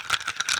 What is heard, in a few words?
quiver; minetest x bows